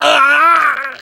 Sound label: choke; source engine; left 4 dead 2; namvet